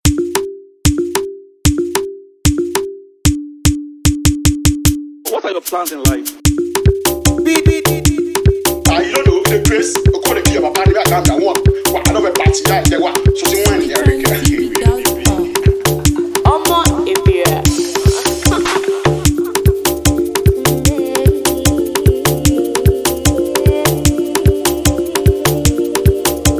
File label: Beat; Rambo